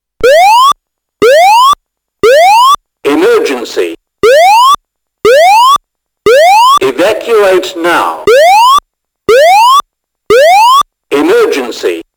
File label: tone